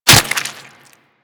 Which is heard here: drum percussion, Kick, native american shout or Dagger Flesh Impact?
Dagger Flesh Impact